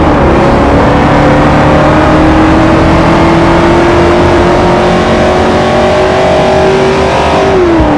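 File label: third, car engine; camarozl1; tdmcars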